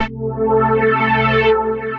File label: Not So Saw, Pad; Ableton; Factory Packs; Punch and Tilt